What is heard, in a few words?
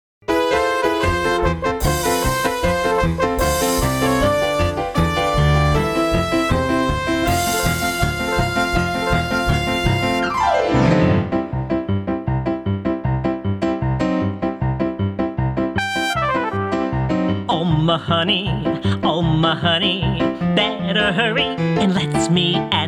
Ragtime Band